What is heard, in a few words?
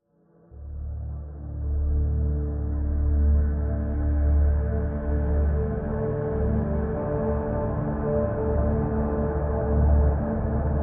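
Drn Dimensional D, drone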